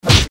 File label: fist hit seq